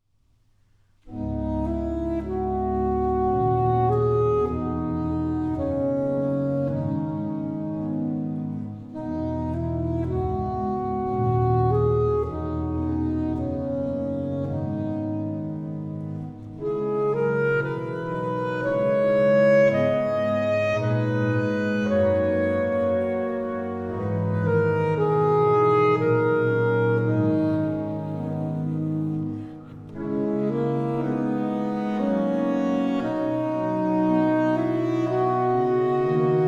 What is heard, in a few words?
Hymn